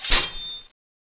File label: summon knife draw; cstrike